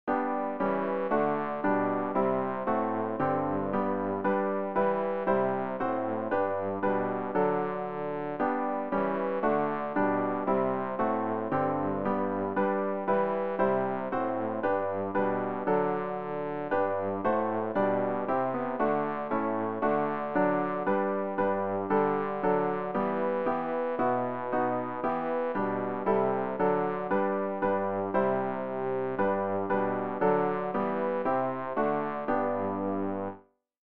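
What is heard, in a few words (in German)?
bass, Übehilfen für das Erlernen von Liedern; ref gesangbuch; rg 163 jesus herr und haupt der deinen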